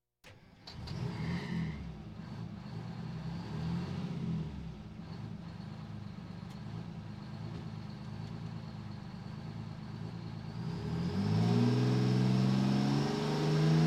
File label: Police, Fire engines